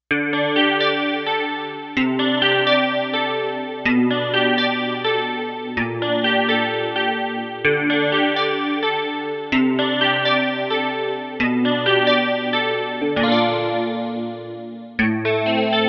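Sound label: Guitar CUBE CHORUS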